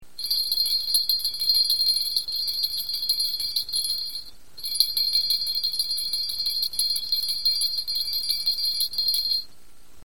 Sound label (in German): lotusschelle kaefig